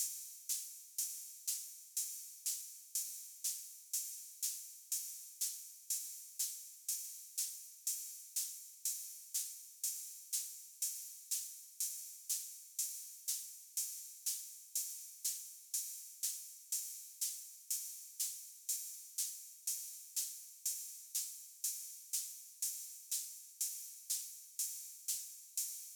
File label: 122 BPM Ride; mmb drum arsenal vol 1